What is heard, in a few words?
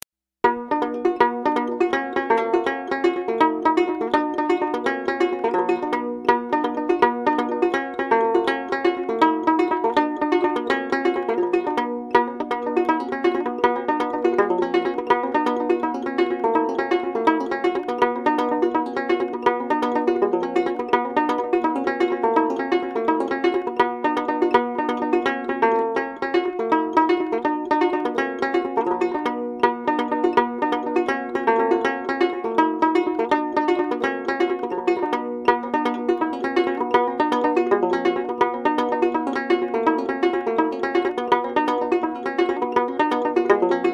Old Virginny Jig